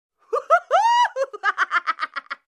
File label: Lady Laughing